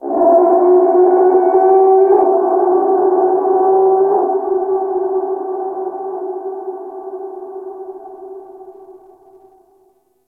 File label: Ice Creature Distant Cry; Warframe; Corpus Ice Planet